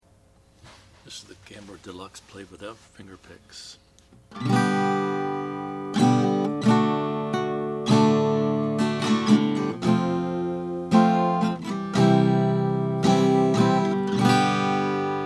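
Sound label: oscar schmidt stella gambler deluxe black, Guitars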